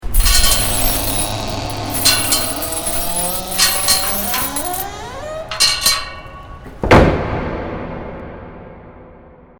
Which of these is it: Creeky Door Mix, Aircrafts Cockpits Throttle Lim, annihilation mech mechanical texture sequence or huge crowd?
Creeky Door Mix